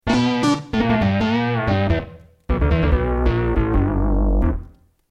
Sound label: Bass, dx7